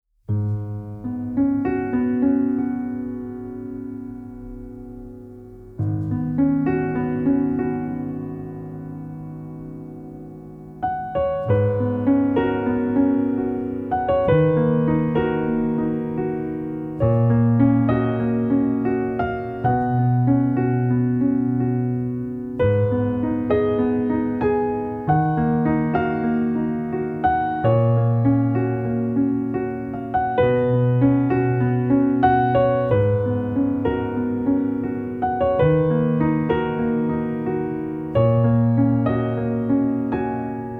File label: Solo Piano Version; Instrumental Music